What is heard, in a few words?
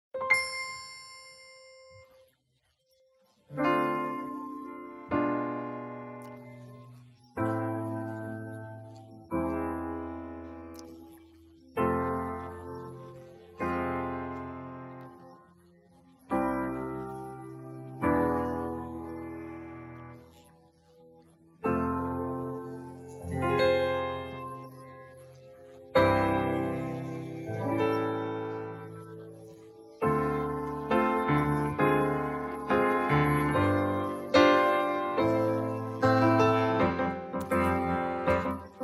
Accompaniment Only